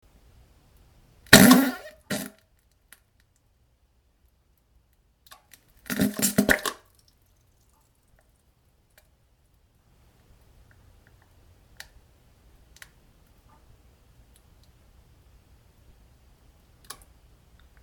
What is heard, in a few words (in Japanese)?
なんべんおなら おならにおされてなんべんぼちゃ音